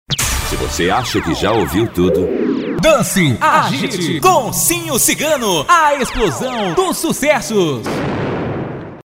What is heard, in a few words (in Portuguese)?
vinheta